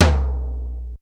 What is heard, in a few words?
LOTOM